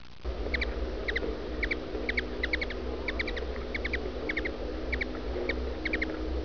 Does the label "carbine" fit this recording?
No